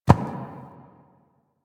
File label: Ram Step Walk Dirt; Animals; DE; Warframe; Quests; Duviri